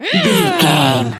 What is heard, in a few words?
voice female a battle shout